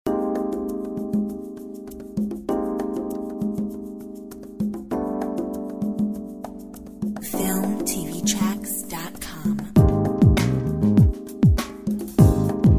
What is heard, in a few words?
Soul